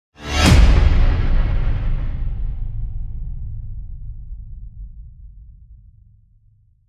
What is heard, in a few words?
Drastic Impact; Gothic Storm Music